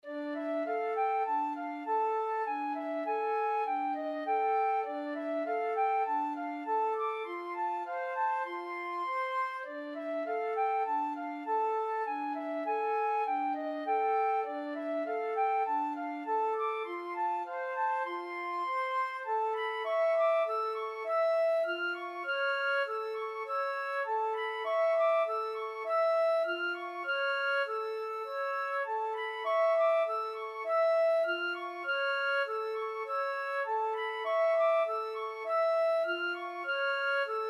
mountain king, flute duet; greig

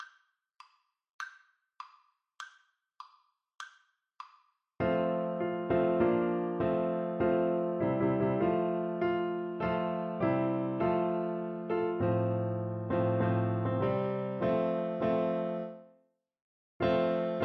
anthem, Clarinet; chinese

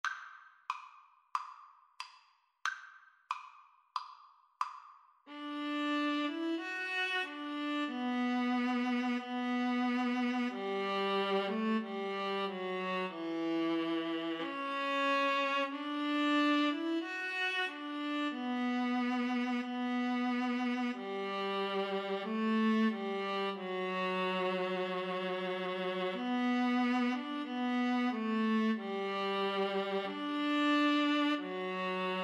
Violin Viola Duet; bleak midwinter

